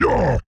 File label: ogre attack; The Monster Plane; kolmods preatt